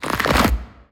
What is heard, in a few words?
bighorn small hole shake